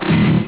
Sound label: shield hit; outpost